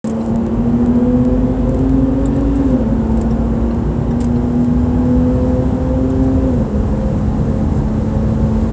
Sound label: Jaguar XE S